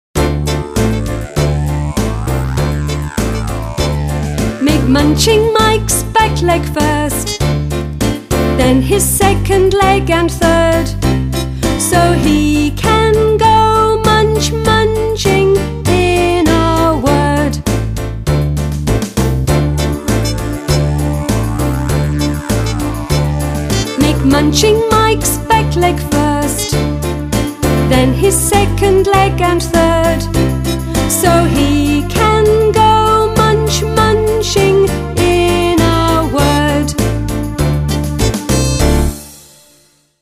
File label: Handwriting Song; 레터랜드